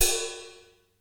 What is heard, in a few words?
XE8 RIDE, drum; Crypton - DrumBase5000